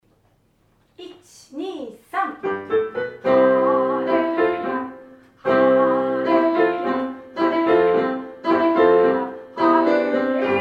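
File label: Hallelujah alto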